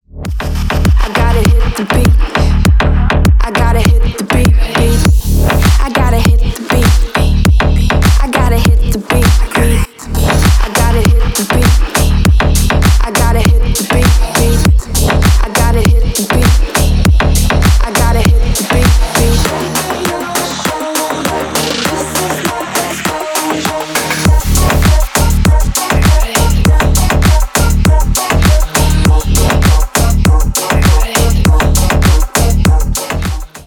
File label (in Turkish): rington; turkish